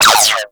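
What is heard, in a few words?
Gatling Gun Projectile By; Warframe; Tau Gatling Gun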